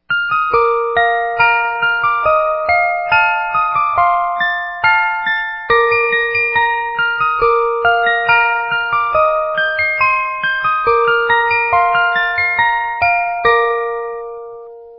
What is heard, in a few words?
FLAUTO MAGICO - MAGIC FLUTE; A.W.Mozart; a strappo